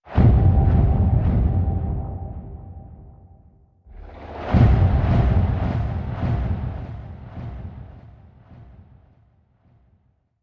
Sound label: Hit Muffled Bounces